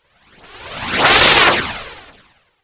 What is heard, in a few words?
Rocket Fly By